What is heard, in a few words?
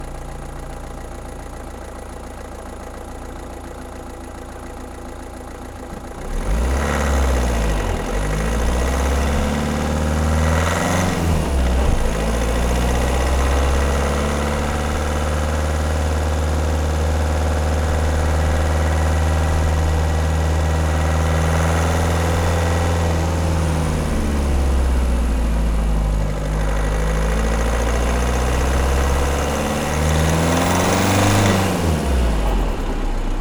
Car Driving Short Engine, Opel Astra H CTDi 1.7; SoundBits